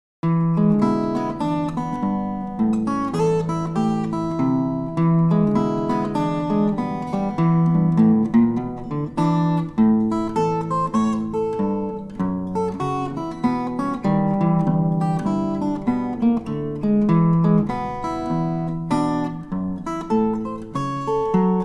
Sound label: blues